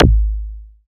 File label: FM SURDO; Optical Media International; Sonic Images Library; SI FX Vol 6; Instrums